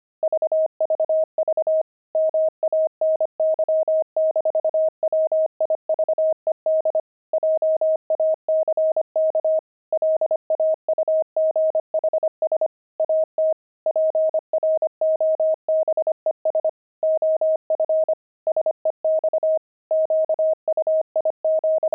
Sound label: pangram, intermediate pangrams files